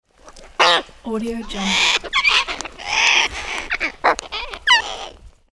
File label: cockatoo dialogues